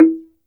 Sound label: SFT SLIT DR, Heart of Africa; SLIT DRM2